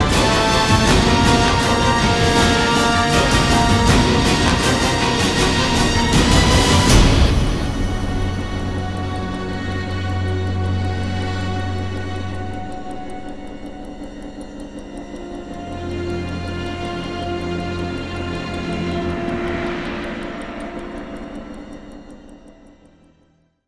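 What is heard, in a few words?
stinger long part end; transformers war for cybertron gamerip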